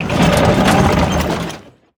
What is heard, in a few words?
Meat Wagon Play